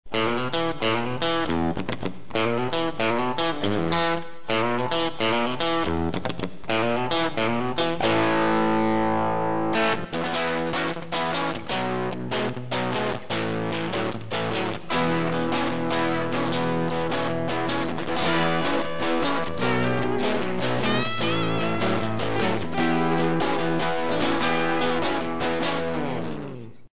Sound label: jfet boot; Tim Escobedo's Bootstrapped JFET Overdrive; Strat - effect - Bulldog Cab Sim - reverb - sound card